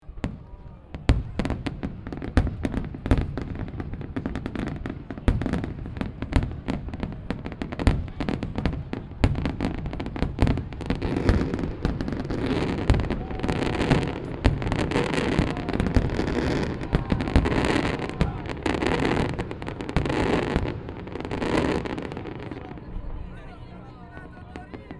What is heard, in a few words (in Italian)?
Fuochi